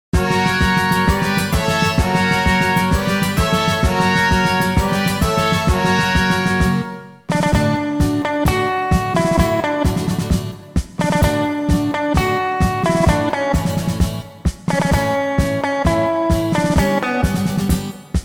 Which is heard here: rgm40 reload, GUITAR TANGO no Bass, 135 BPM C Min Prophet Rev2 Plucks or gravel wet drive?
GUITAR TANGO no Bass